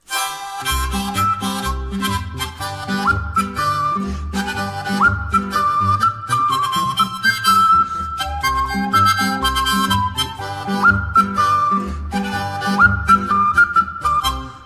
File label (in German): pongauer polka